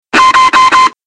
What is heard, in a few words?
b757 767 master caution single